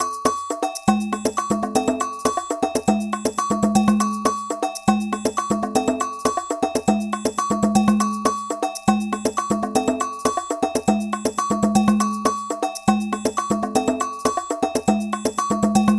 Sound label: Latin Rhythm